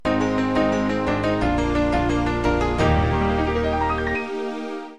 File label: jingle